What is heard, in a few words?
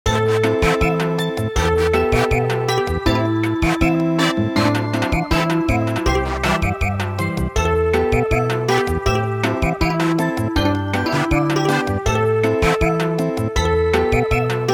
Samba, midi; Hame Hame Hame